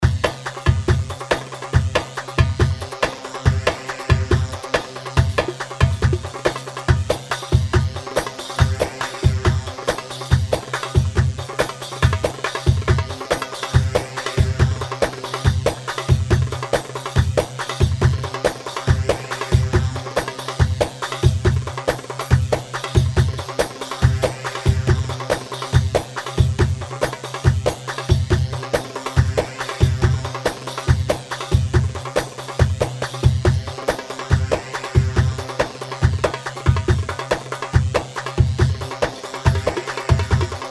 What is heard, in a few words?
Saiidi 140 mix, Darbakati, Sharqi; صعيدي